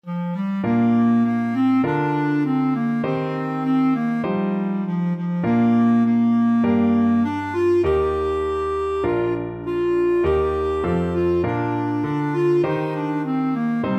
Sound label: loch lomond, clarinet